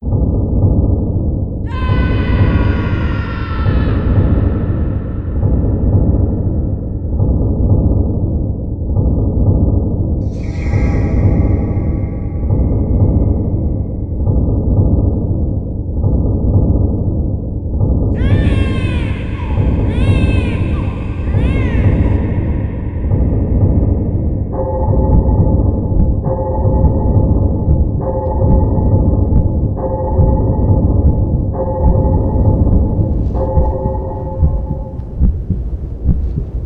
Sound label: ambiance hell